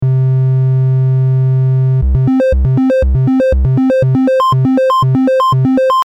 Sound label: triangle